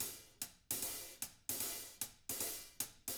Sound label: HIHAT LOOPS, Living Drums